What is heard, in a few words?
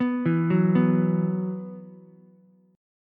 Gb 7th Suspended 4th Gb, Ukulele; arpeggiated; JGuitar